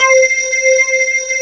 CRYSTAL SYN

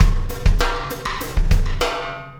Wormhole Beat, Processed Drumkits

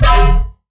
bass hit Hollow Yoink E; Virtual Riot

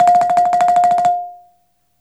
BALAFON F; E-MU Producer Series Vol. 7 – Old World Instruments

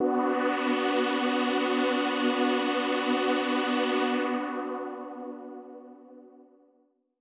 Dramatic B3; KG MegaSaur

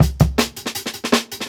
Log Drum, Jungle Breaks; Original Jungle Breaks

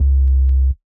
House Sub; Big Fish Audio; Old Skool House; OSH Bass

